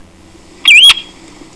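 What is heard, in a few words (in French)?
pluvier semipalmé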